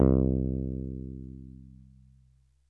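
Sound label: electric bass; ZERO-G; Datafile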